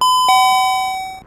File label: metro